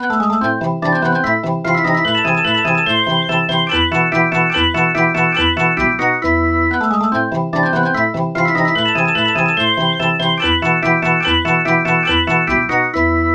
percussive organ mozart

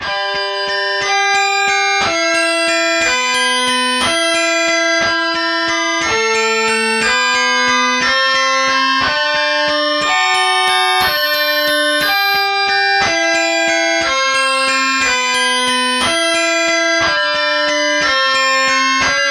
guitar harmonics

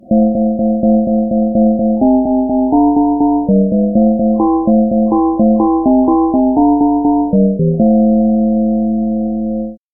glockenspiel bass

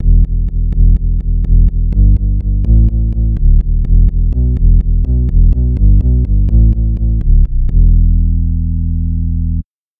synth bass

